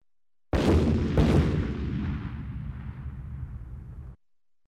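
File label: plastic explosive explosion double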